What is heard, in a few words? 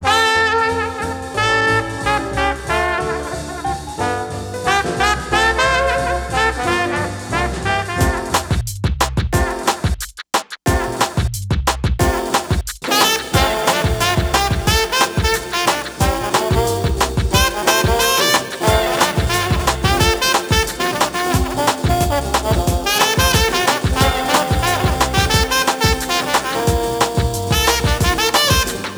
electric